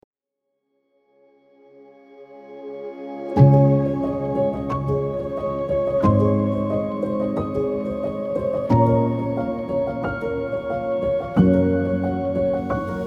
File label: beautiful fairy piano